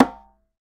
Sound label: Conga Hi Mt; Roland BK-5; Percussion